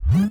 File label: voice on; opensb; interface